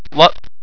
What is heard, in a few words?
jump